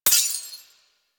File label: Sword Enemy Frozen; Weapons; Melee; Warframe; Orokin Weave Sword; Weave Boss